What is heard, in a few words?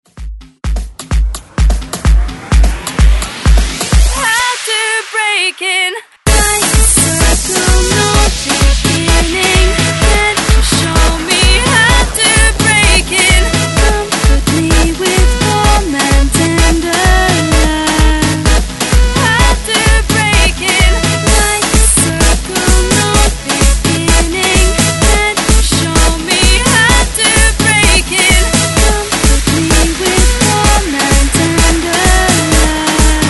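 breakmix 128 bpm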